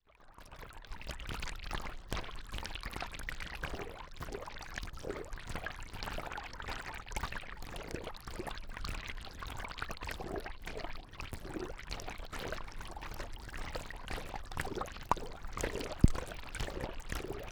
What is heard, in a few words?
Hydrophone, Underwater, Splash; underground sounds